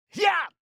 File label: attack; BELMALE